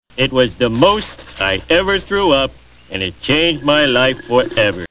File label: threw up